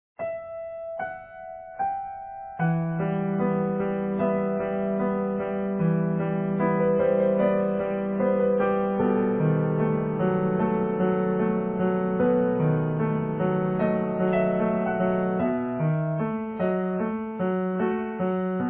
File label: Easy Piano